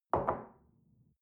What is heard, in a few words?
shell place on table soft, gastronomie; berufe; soundpool